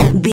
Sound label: retro impact hit general